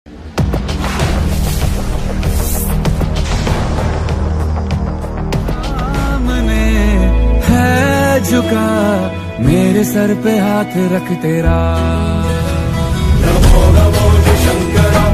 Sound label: ringtone hard mix; mahakal